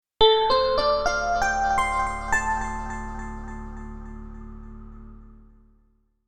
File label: Startup, Computer: Short Musical Style Phrase; Miscellaneous Musical; Stuart Duffield